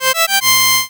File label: tada